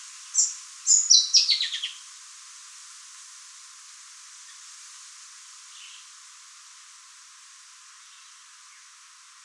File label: sunbird mouse-colored; APerkin